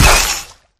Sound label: pop; flood